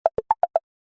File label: multimedia notification subtle ui clicks pattern